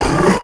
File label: trando block, creatures; Star Wars Obi-Wan; XBOX